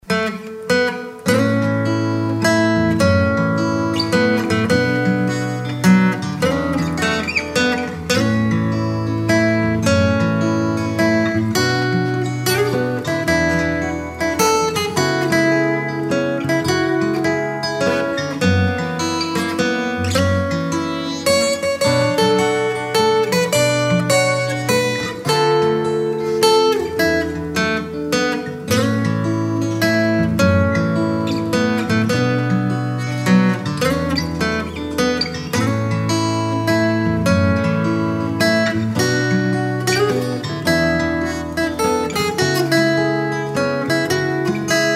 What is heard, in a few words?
hat